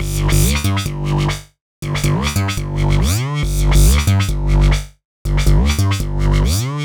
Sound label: 140 G BASSLINE, Dubstep; Loopmasters; The New Sound Of Dubstep; BASS LOOPS